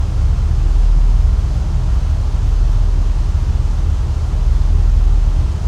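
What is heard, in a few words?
machinery in loop; Garry's Mod; tf2; cp harbor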